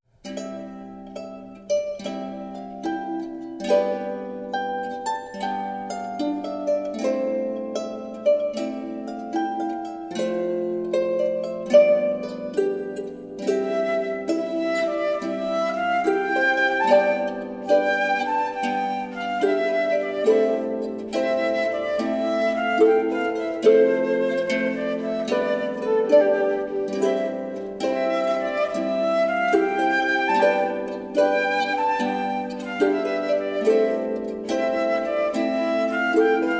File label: Harp Flute